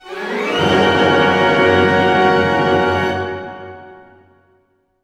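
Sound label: glissando F# major, orchestra; Sound On Sound - Peter Siedleczek's Orchestra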